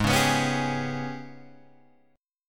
Ab Minor Major 7th Double Flat 5th, down, Guitar, Open G